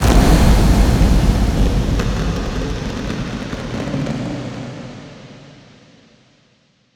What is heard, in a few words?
cinematic transition; trailer; Bluezone Corporation